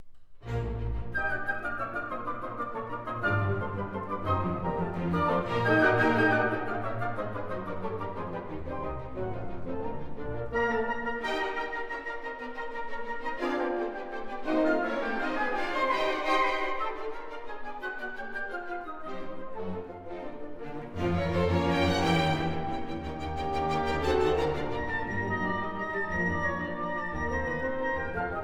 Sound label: Variation Vivace Poco presto; Ivan Fischer; Brahms; Haydn Variations (2009); Variation 5